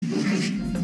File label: deoxys cry